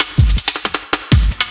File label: LOOP SD, 160 BPM, Drum & Bass; Ueberschall; Drum & Bass Flash Trackz